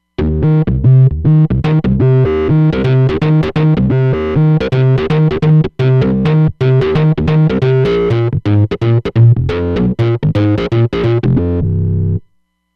Underneath, Roland Fantom XR

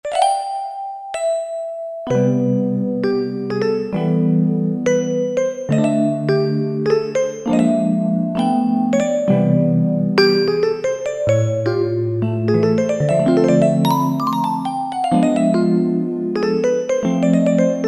Vibraphone, Roland D-50; Factory preset demos

